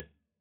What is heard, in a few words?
Formula Can